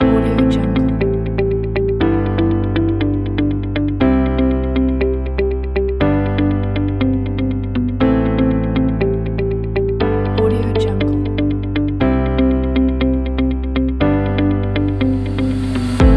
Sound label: nostalgic